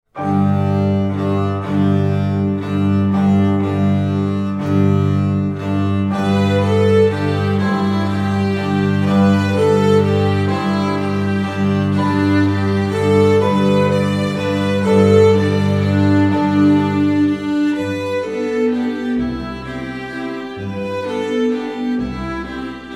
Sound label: Bagpipes